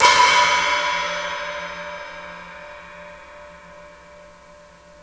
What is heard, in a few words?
hi open, drums